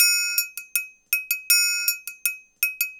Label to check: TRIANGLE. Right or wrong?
Right